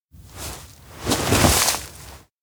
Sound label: stand grassland; anemoi